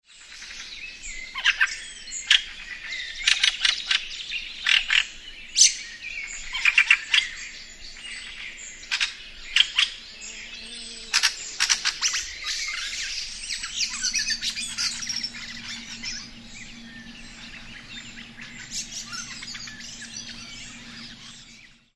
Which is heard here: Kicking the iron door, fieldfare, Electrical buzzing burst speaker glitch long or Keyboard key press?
fieldfare